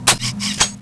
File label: change empty; vulcanus3